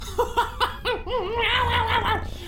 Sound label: meow laugh; biskit